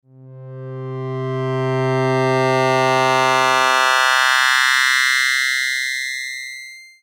harmonics to 16

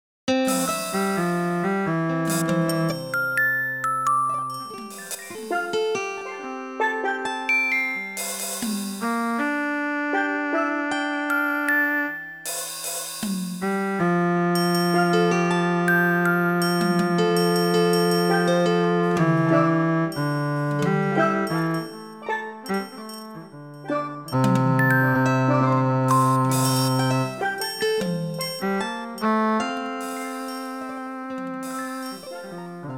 cello tune fibonacci with two to one beat ratios

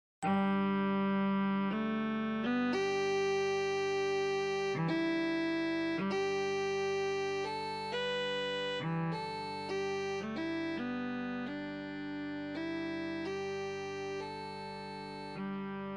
fiddle playing

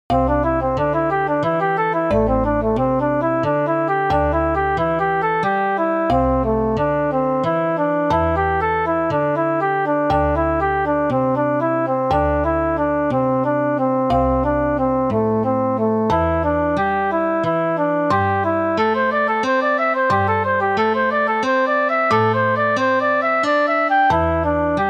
changing rhythm 2o4, 3o4, 4o4; fractal music; Multiple seeds

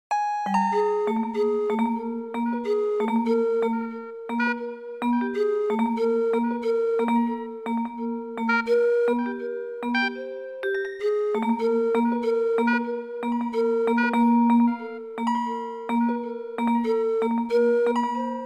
square numbers scale steps, fractal music; hocketting

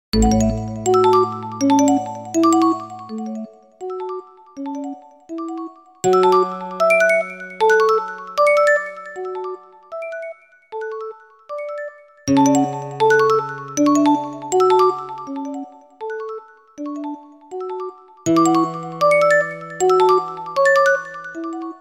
windchimes scale

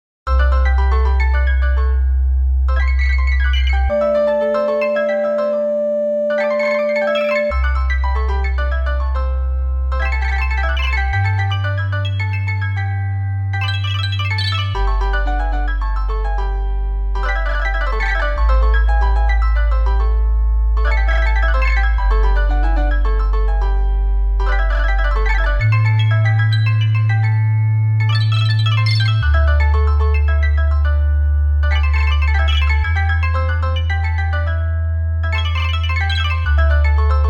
oboe piano

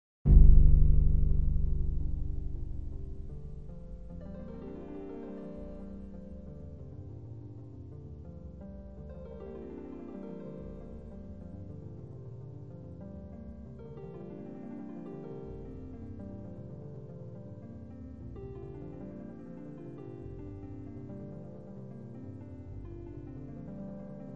seven limit slendro harp; fractal music; Sloth canons

